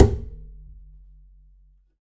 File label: perc, pizz; meatbass